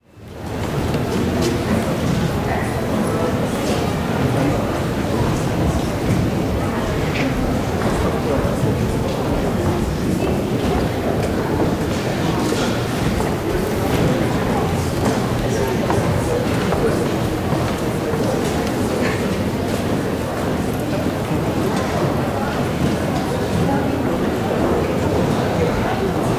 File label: Crowd Indoor Light Old Wooden Hall, Walla; Sound Ideas; Walla Series